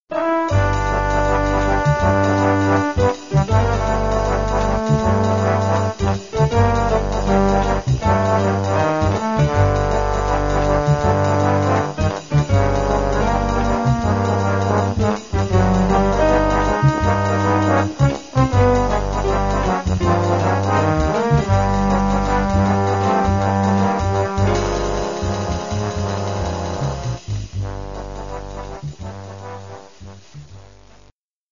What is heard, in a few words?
trombones